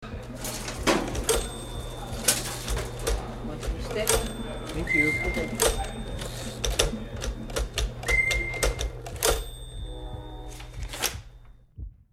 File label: elevator sequence